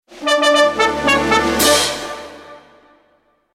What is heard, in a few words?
fanfare short bonus; the prometheus secret noohra